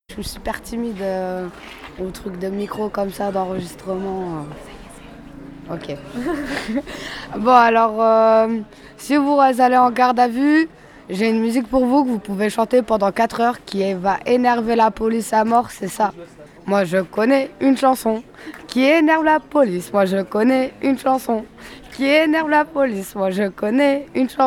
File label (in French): chanson